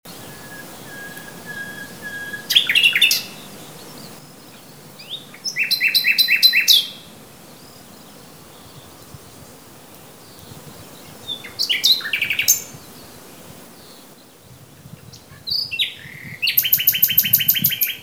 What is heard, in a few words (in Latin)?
Luscinia megarhynchos; SETE